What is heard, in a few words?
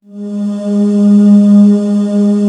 VOCAL A#2, Synclavier; ILIO; Synclavier Strings